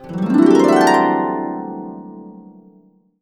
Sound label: C, Harps; USB Soundscan vol.62; Complete Harps; AKAI